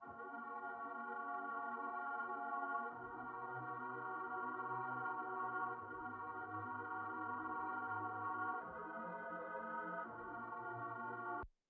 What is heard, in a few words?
84 BPM D# Min Pad; Loop Stems; Cymatics; 2022 Melody Collection Platinum Expansion - Pt 2; Tonight